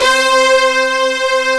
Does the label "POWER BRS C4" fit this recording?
Yes